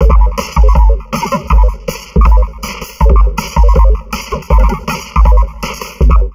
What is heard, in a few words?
160, Drum Loops Crazy Processed